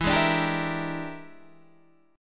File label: E 7th Sharp 9th Flat 5th, chord